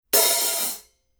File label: Color Sound 900 Red Hi Hat Paiste